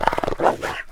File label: wolf; kult